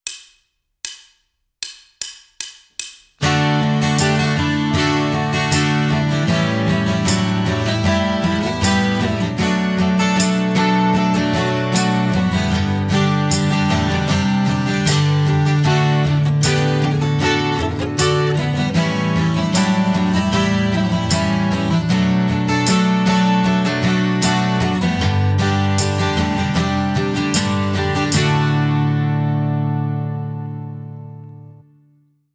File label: acoustic, Guitar; Guitar Techniques 2019 Issue 290 January